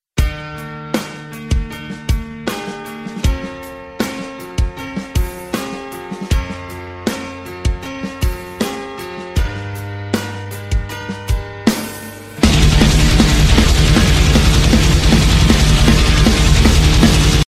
rock

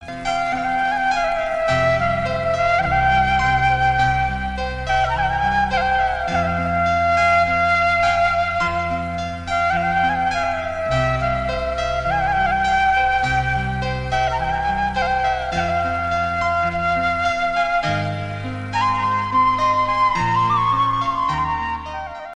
crazy malayalam sad tone

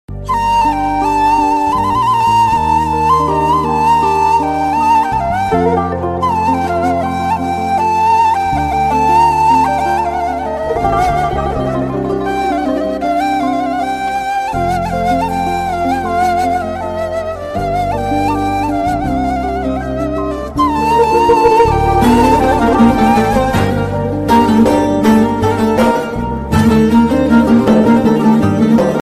sad turkish flute turkish

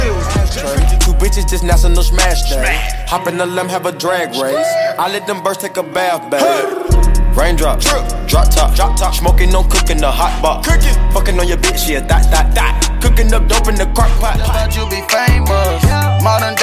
new hip hop

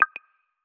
sharp aquos s2 sms

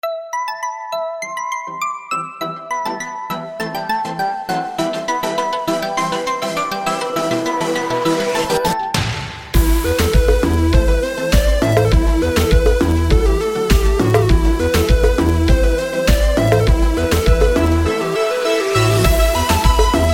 electronic sweetness

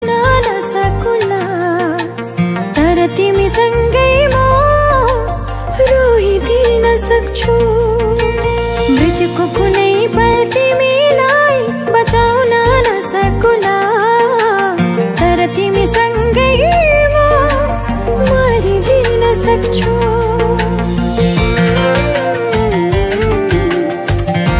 nepali loving

